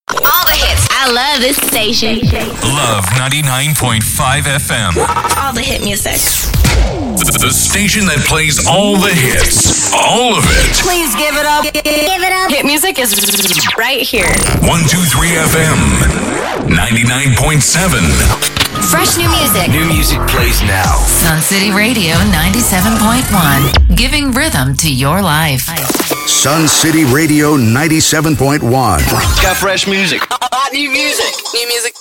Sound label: MUSIC JINGLES